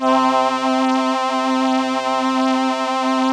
VP-330, String Machines

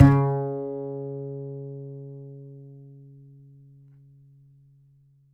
Upright Acoustic Bass, 1ST POSITION; Larry Seyer

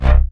DM BAS, Dance Mega Synth

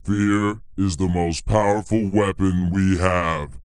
PITCH LOW, Vocal, SCI-FI; 5 SCI-FI CHZ

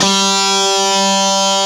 D3 guitar; AKAI S-Series CD-ROM Sound Library VOL-8; SET#1 GUITAR

